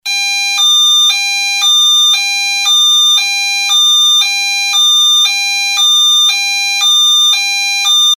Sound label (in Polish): ton MIDI